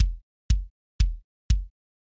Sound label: kick